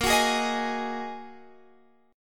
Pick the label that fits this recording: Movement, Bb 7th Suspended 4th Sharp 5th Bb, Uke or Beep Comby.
Bb 7th Suspended 4th Sharp 5th Bb